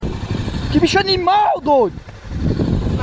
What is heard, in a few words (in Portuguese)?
acidente tornado vs citroen aircross